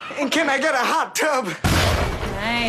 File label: hot tub